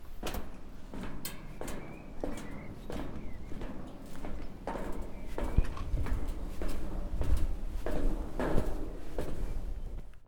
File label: Footsteps On Outside Metal Ground